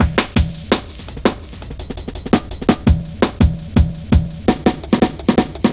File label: Drum Loops; Zero-G Jungle Warfare 1, 2 & 3